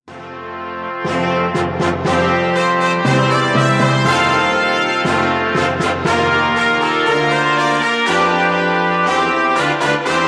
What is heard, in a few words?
brass